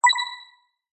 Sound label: button